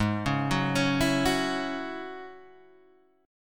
G sharp 7th Suspended 4th Sharp 5th G sharp, Guitar DADGAD